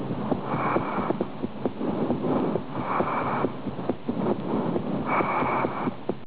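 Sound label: tubular, pulmonary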